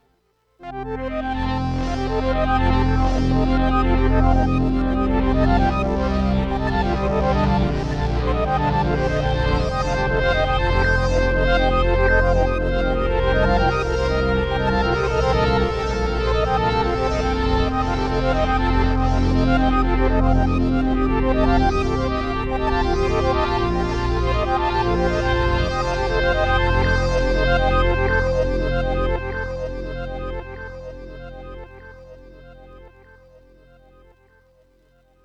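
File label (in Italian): Pattern, groovebox con sintesi FM; sonicware liven xfm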